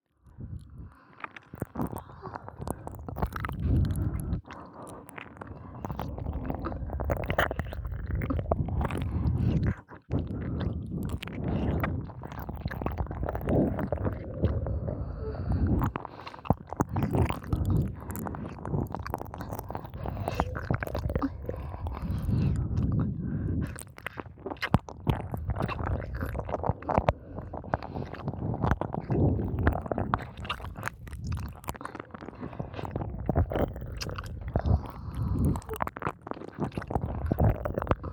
片耳奥舐め＋ベロチュー

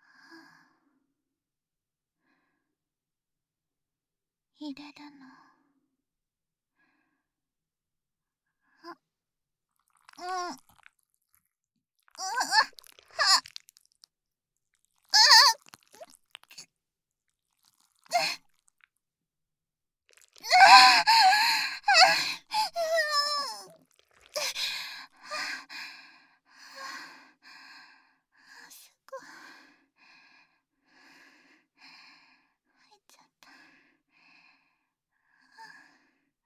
挿入 喘ぎ; SEあり

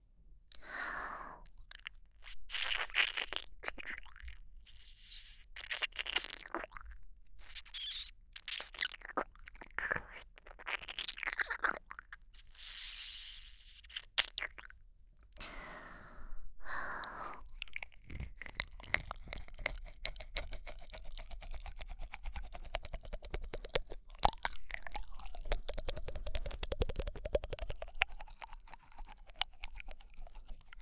耳周りコース

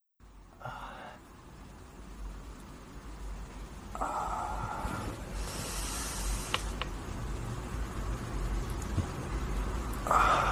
脳 が とろける 男 の 喘ぎ声; オナニー実演; おもわず腰が動きだしちゃうセクシーなASMR

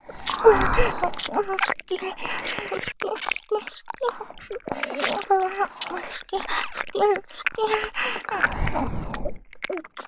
左右同時耳舐め × Dキス 好き好き, ASMR

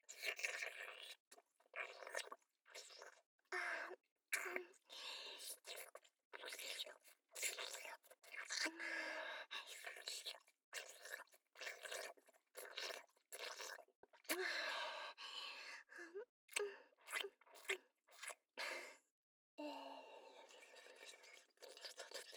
フェラ; アドリブ集

